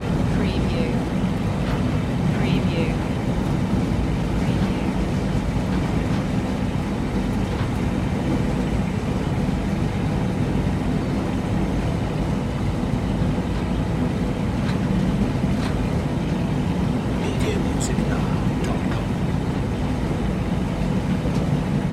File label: ferry engine compartment